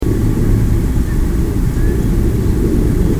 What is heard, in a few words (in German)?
brach